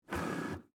Arcade Game Wheel, Technology, Arcade, Game, Wheel, Ball, Spin; Arcades